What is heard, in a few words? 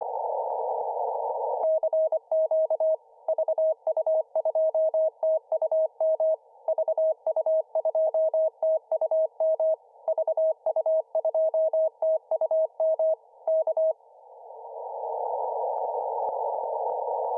CW own Signal; Mesat-1; VU2TUM